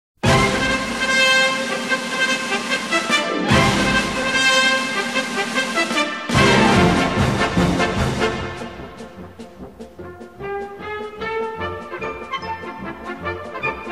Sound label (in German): Lustiger Marsch